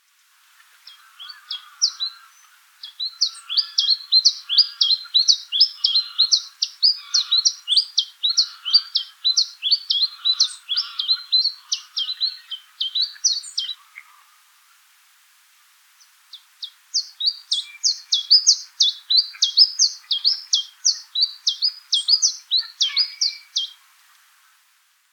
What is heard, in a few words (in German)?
Taigazilpzalp; Altmuehlsee WUG; Andreas Stern